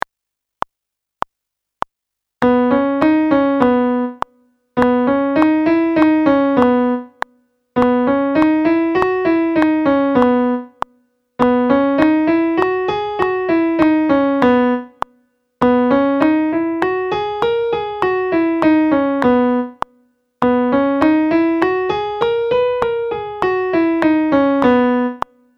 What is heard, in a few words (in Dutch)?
Toonladder B oefening piano